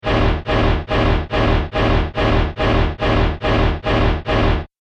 Repeating Power Sound, Science Fiction Sound Effects